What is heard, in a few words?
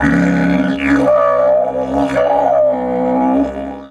C#2 WILD, Didgeridoo; Big Fish Audio - Didgeridoo and Other Primitive Instruments